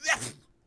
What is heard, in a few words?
Sneeze; Civ4 warlords